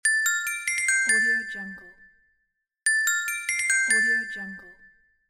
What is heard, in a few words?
the ringtone